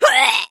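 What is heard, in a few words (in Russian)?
jenshina podav ee, woman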